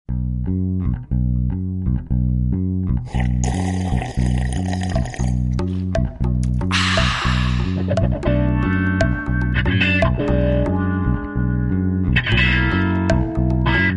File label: funk out